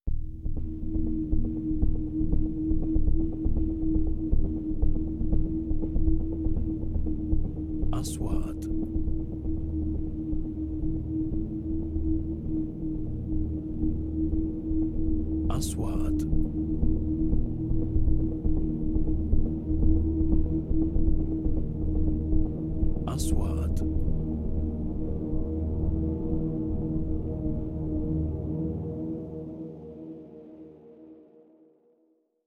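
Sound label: 3D SFX Trailer; مؤثرات تشويقية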